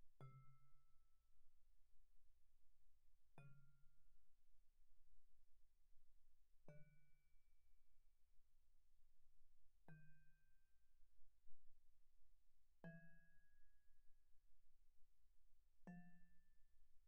Vibraphone dampen pp C3 B3; Percussion

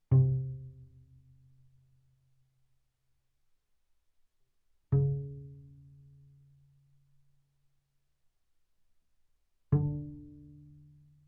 Bass pizz sul D ff C3 B3, double bass

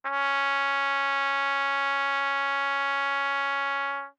Trumpet novib ff Db4 stereo, Bb Trumpet; MIS Pitches - 2014; Brass

